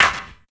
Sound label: PERC; Houseworx; Drums